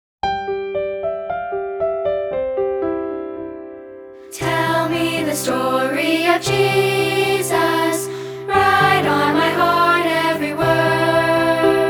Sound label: Piano